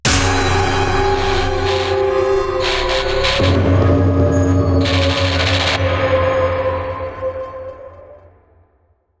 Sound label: Tension Builder Impact